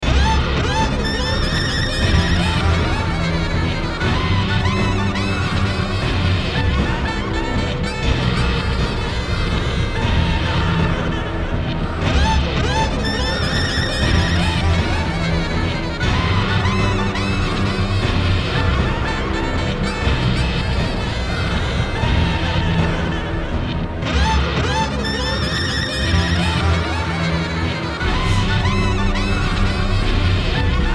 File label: Red Tree Ambient Version; Deadly Premonition